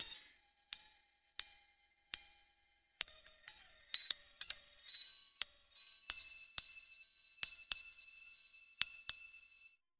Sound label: tight digital pluck tone